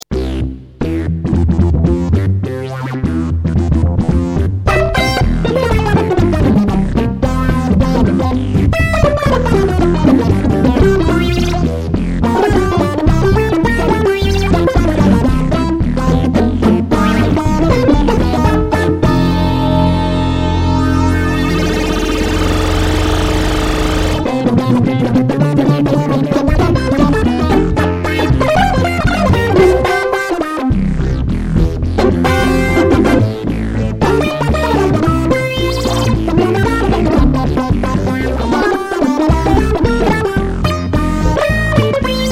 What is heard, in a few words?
CS80